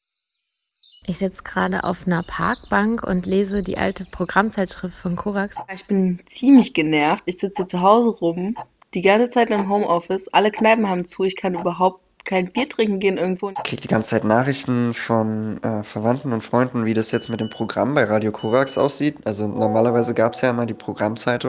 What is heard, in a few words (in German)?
Jingle Programmzeitung April Mai; Halle